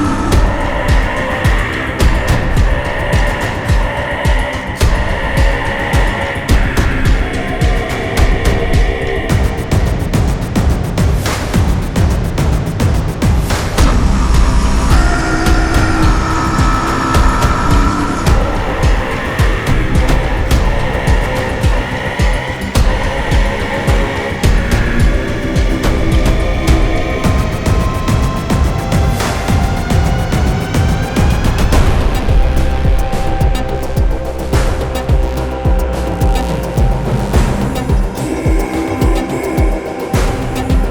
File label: Barbarian Hordes Cue Loop; Tau; Tau Quest Cues